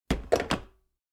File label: coffee pot set down; appliances